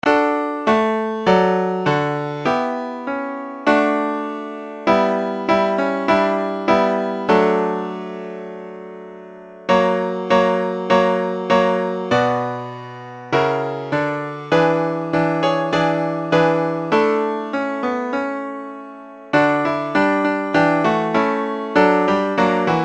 Christmas bells midi

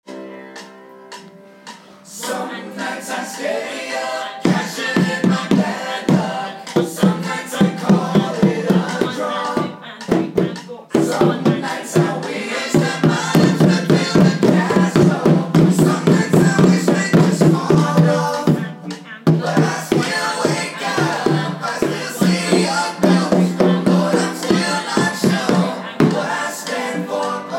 rhythm composition

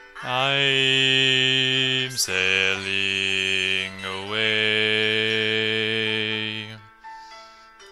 bass line

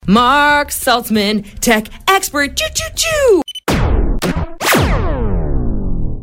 theme song

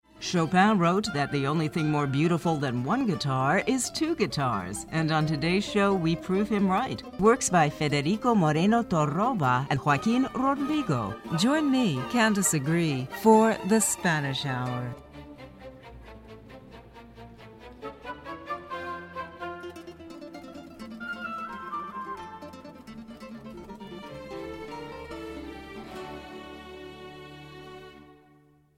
2 guitars r better than 1

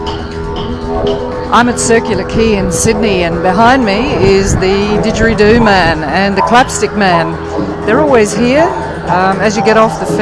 didgeridoo

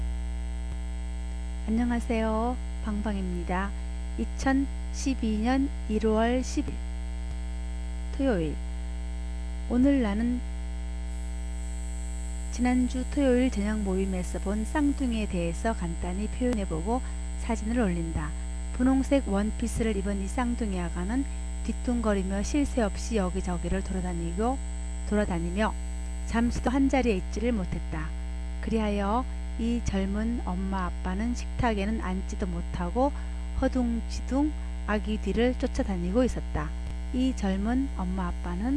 korean twin baby

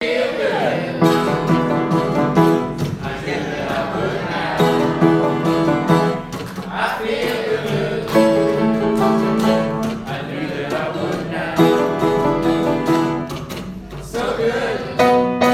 school choir; lansdowne

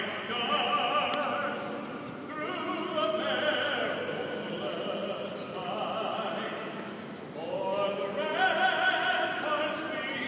us national anthem at at&t park sf

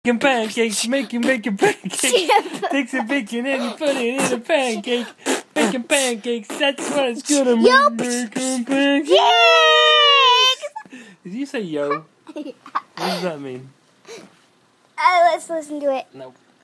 i sing beatboxes

